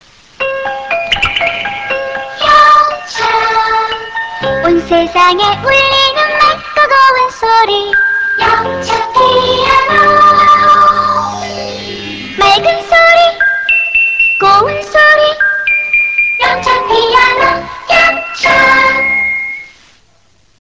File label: piano